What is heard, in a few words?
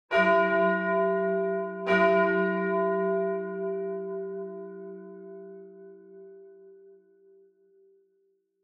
Church Bell High Mid